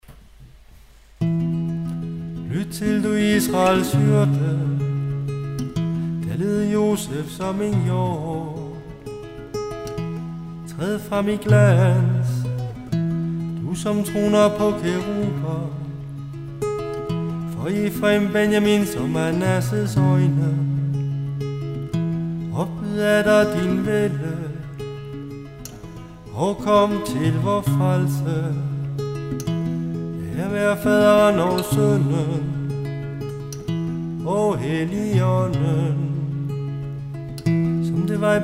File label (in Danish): tone gregoriansk